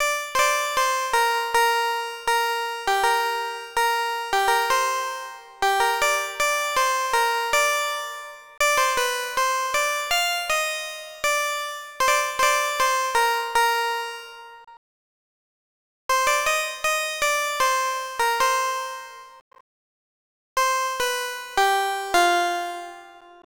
bell lead melody

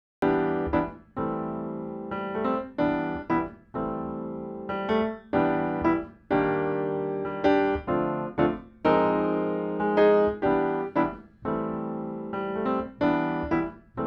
Piano IN

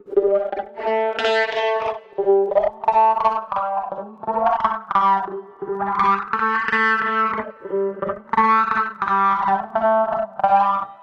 Guitar 140, 87 bpm

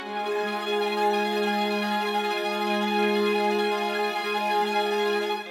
string sectio loop, 87 bpm

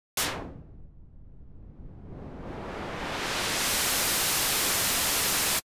Saw Wave, 87 bpm

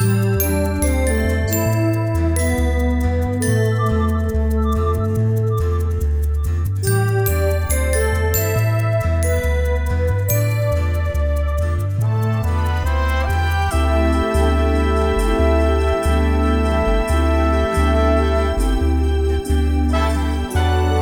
phase; 30 second stripped

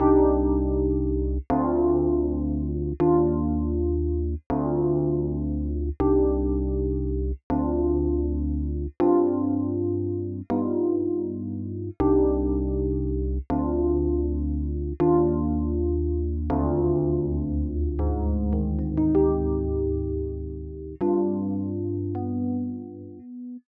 Chorused Electric Piano intro

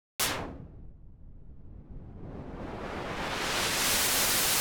Saw Wave, 87 bpm

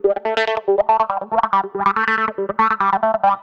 Guitar 140, 87 bpm

